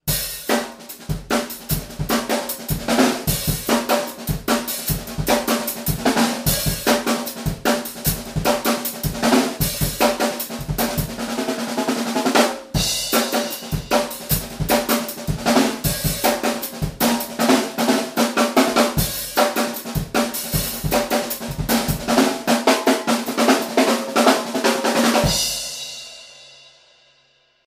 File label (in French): jungle doppler caisse claire rythme